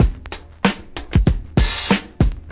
classic brk; DRUM LOOPS and BREAKS; VIBRIS CLASSIC BREAKS

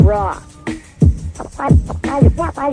rockbeat rock scratching, 088 bpm, drum loop

